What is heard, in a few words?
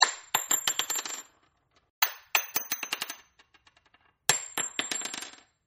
Handgun Shelldrop Single, Single Handgun Bullet Shells Drops And Bouces On Tile Floor; Single Handgun Bullet Shells Drops And Bouces On Tile Floor-2 Takes; Impacts